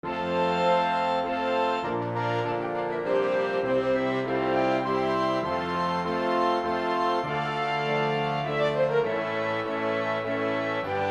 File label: Trombone Concerto Band; trombone concerto for concert bands